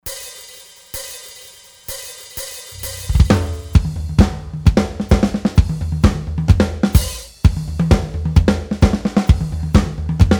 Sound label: drum sample